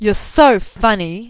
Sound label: death